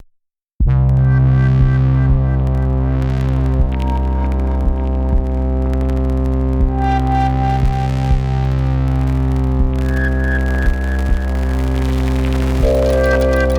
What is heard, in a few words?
multiphonics; hugo leger; lonely